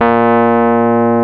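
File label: piano Rhodes Mk.V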